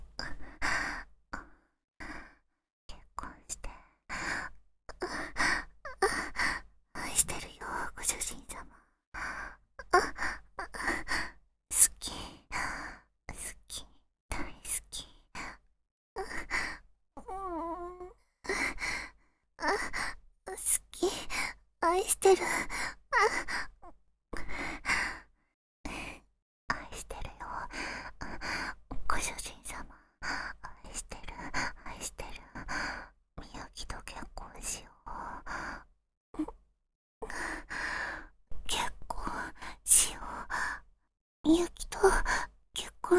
喘ぎ; 深雪

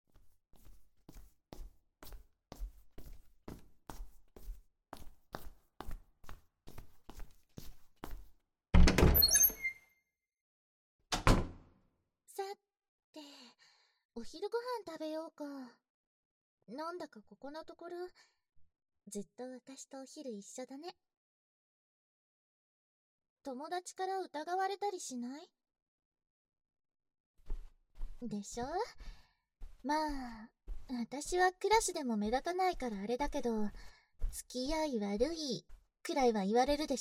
イチャイチャ耳かき

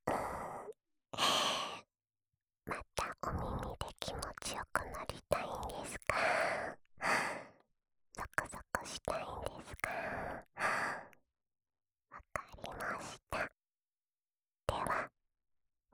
吐息ループ（右耳）, ASMR

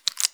くちゅ音単発

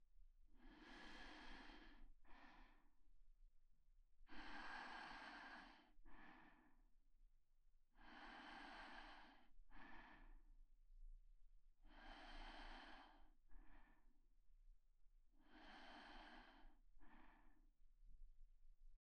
寝息 トラック; vol.6 コーンスネーク; 一緒におやすみ

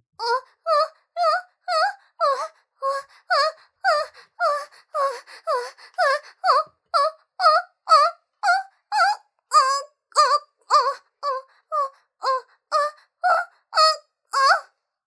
ノーマルマイク おっ！♥おっ！♥おっ！♥おっ！♥

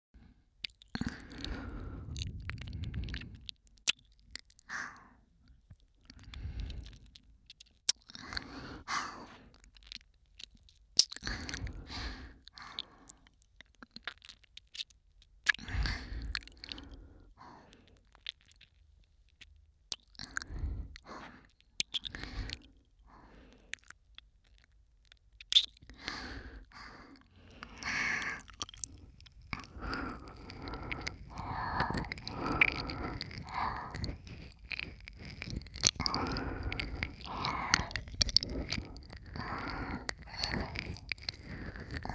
極・耳舐めオンリー 右耳用, ASMR

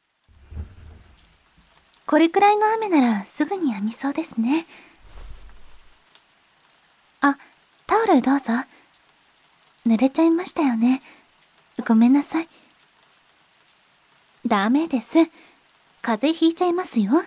雨音と耳マッサージ、耳かき, ASMR

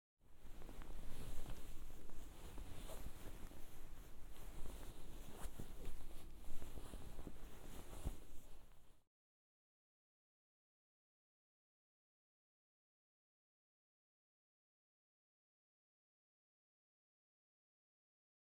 綿棒で耳掃除 右側 ASMR

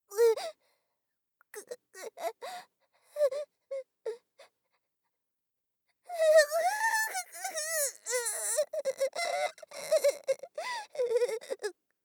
通電; 効果音なし

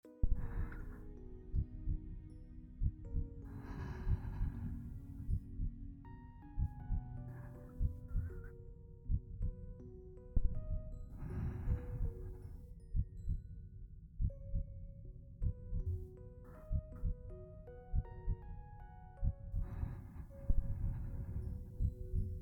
おねむりなさい 寝息 BGM(心音有り）

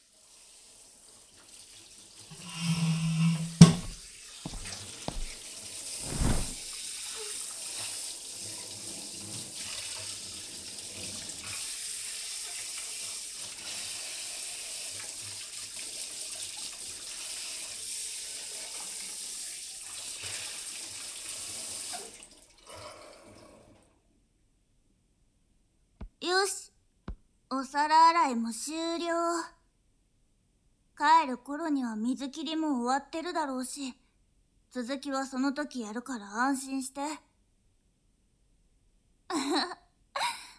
メイドさんの膝枕で密着耳かき

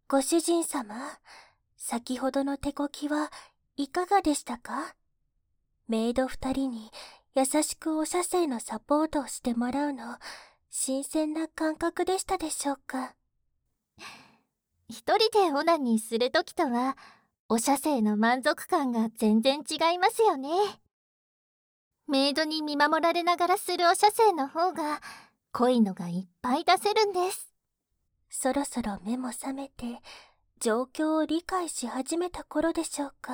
耳舐め, ASMR; フェリシアとシャロのご主人様好き好き耳舐め♪

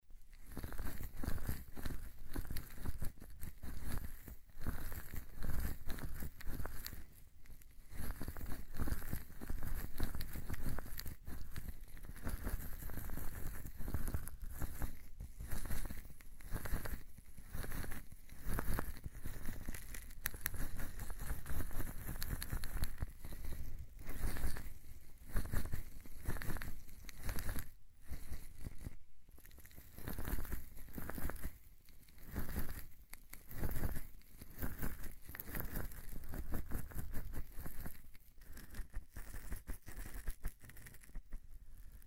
マッサージ, ASMR

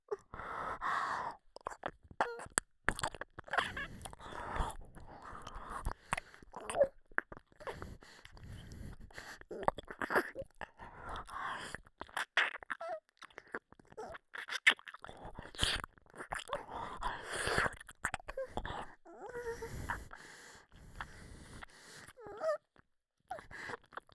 ピストン耳舐め, 左耳, ASMR

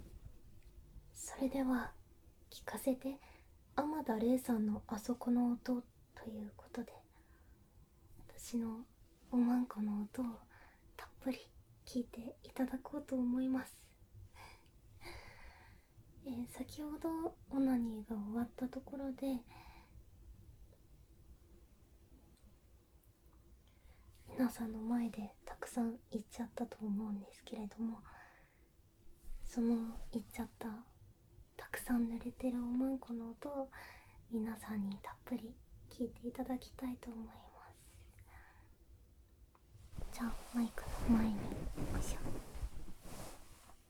聴かせてくちゅ音 ステレオ

